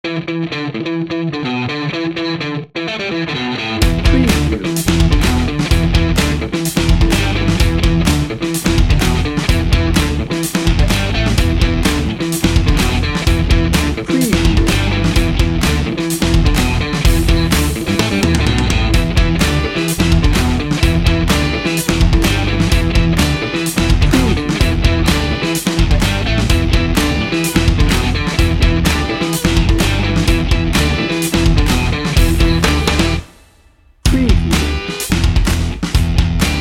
bouncy

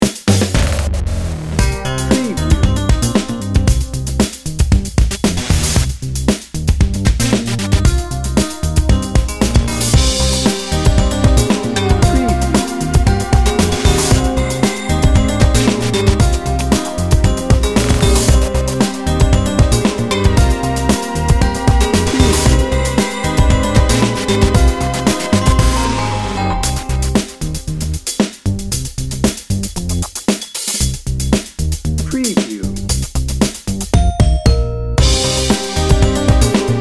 crime story

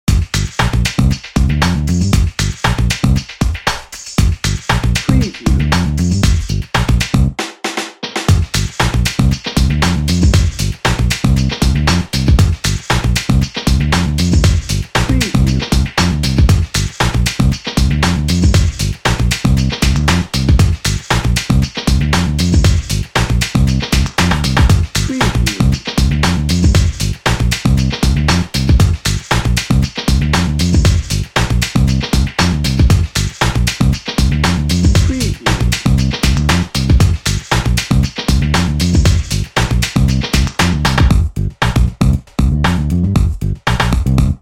play day dnb

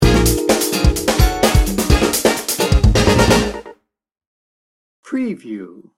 funky feel good sting bumper

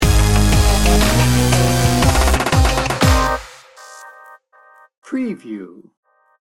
dubstep gangsta downtempo sting bumper

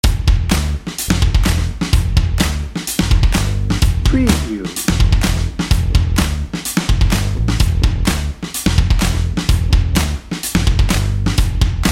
bouncy dnb

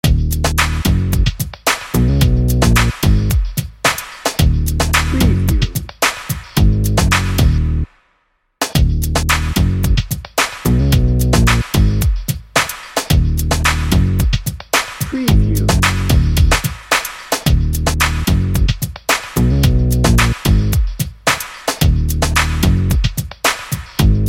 cool funk dnb

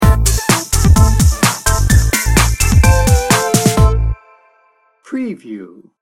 bouncy beats sting bumper

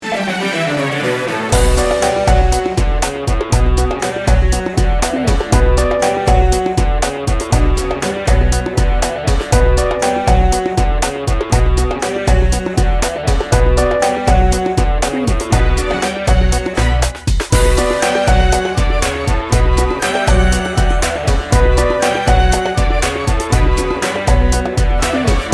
bollywood temple dance

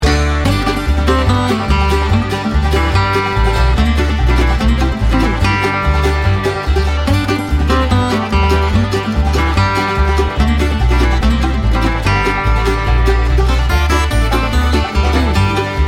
bluegrass

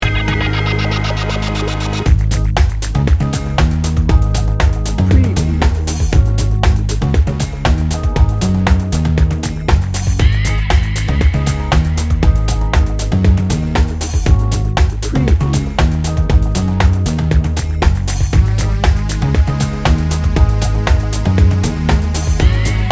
beat bounce

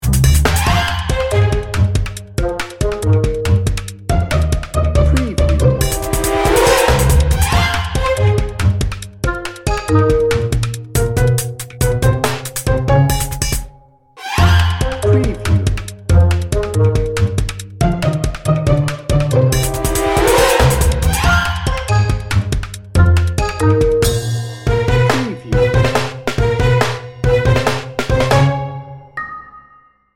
busy pluckers

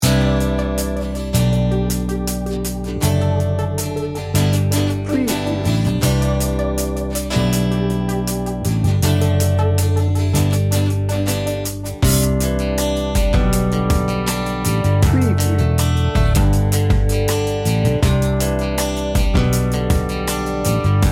laid back and warm bed

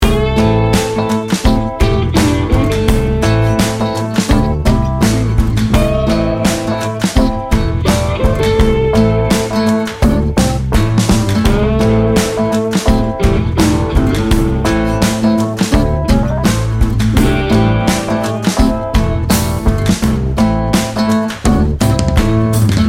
gator hunt loop

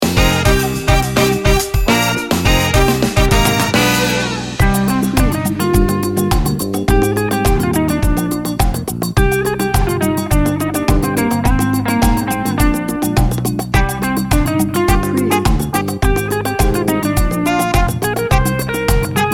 latin carnival

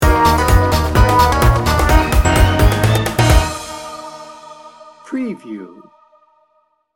god rest ye merry gentlemen sting bumper

